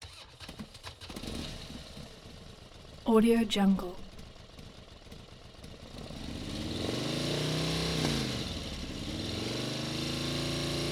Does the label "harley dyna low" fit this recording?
Yes